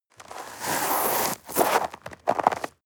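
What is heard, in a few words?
step snow walk limp single, footsteps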